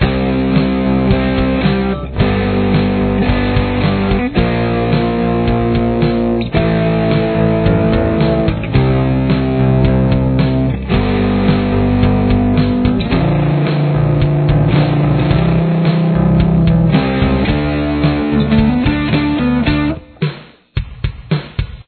chorus, guitar

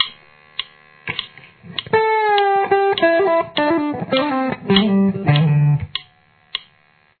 outro

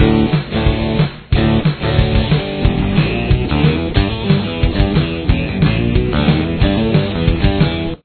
chorus, guitar

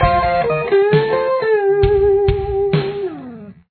lead, guitar

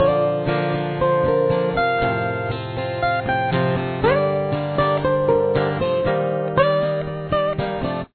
solo

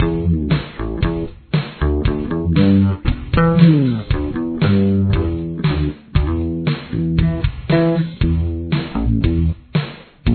bass

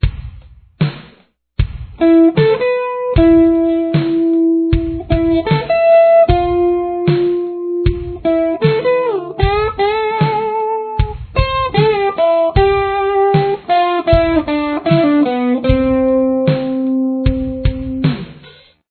intro guitar

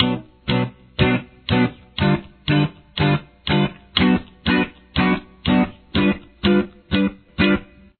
piano

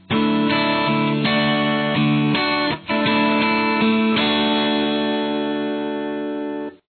D add9

